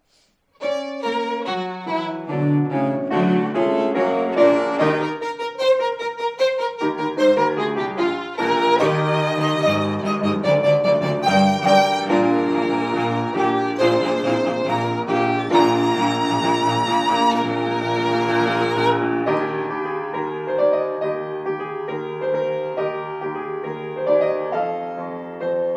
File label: piano trio